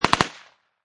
Machine Gun Uzi Model A Short Burst; Machine Guns

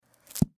Office Book Hard Cover Close Slow, thud; Thuds; Objects

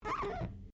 Glass Squeak Rubber; Material components for constructing more complex sounds; Materials; Foundation

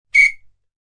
Referee whistle blows; Whistles; Objects

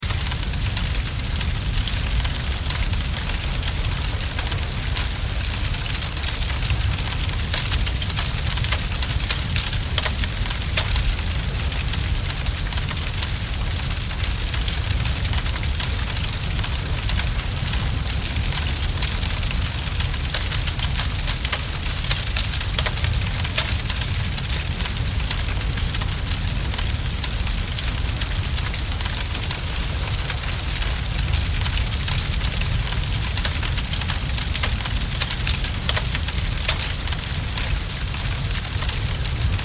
Blaze Wood Heavy Flame Crackle Loop; Flame Sound Effects

